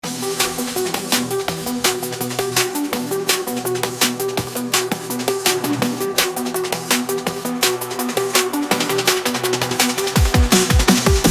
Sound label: Drum Bass